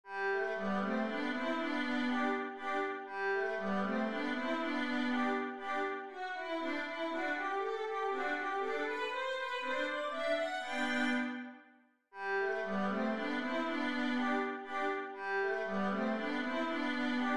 etude, violin

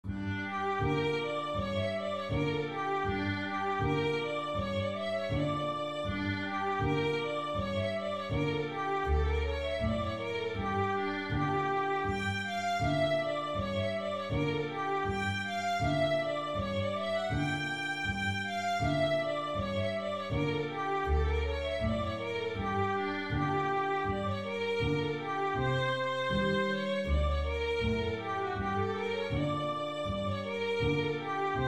hasapikos full

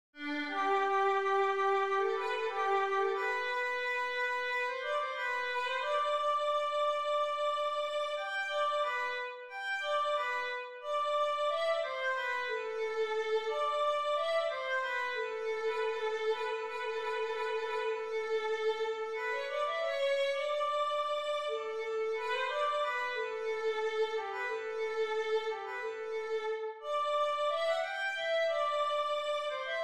violin melody

